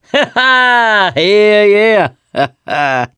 laughter, mechanic, survivor; voice

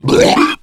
male boomer disrupt vomit; voice

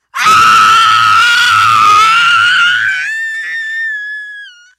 death scream, teen girl; player; survivor; voice

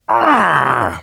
hurt major, survivor voice, biker

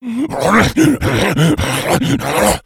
shout, infected; npc, alert, becomealert

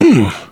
shoved

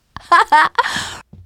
laughter, teen girl

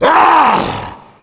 tank yell; voice

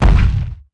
walk, footsteps; tank

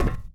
metal, footsteps run; survivor

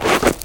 sand, footsteps infected run

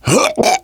choke, survivor voice, biker

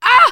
hurt major, teen girl

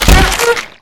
rifle swing hit; survivor; clown

